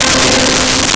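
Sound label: Synth Brass C5; Nintendo; July 2020 Gigaleak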